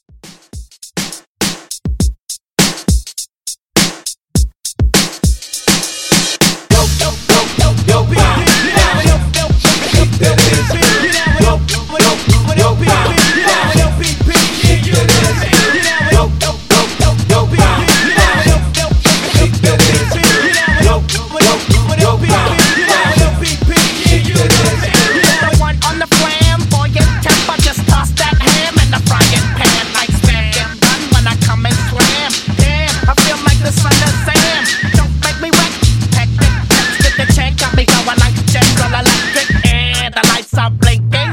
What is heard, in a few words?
Hype Re Drum 90s Hiphop